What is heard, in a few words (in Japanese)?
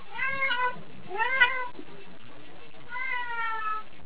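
neko, cat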